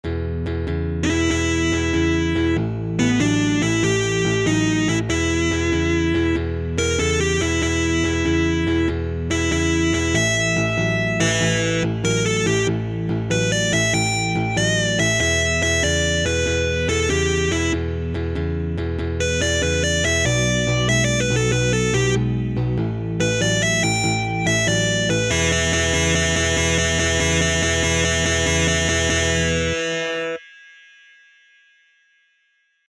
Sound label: Easy Blues Solo in E